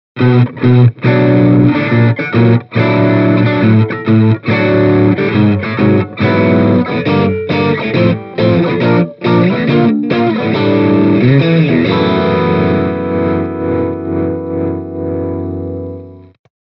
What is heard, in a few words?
Mic Inside Overdrive Stratocaster; Mini Rox; Nordik Gjallarhorn